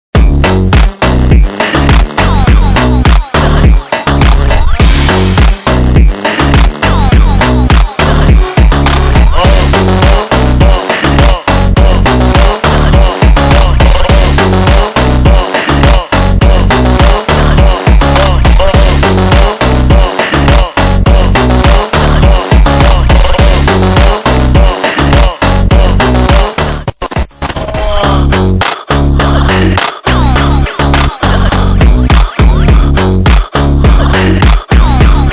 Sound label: super slowed reverbed